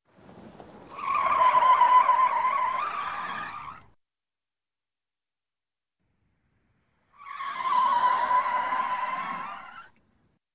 Auto, Tire Squeal Squeals While Turning Hard; Skid; Sound Ideas 2000 Series

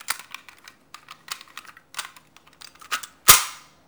Gun Handling Weapon, Weapons Foley; SFX Kit

